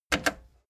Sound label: plastic button slack click fast